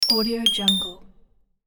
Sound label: mobile ringtone bells sequence; interface sounds